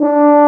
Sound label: BRS TUBA F; Roland L-CDX-03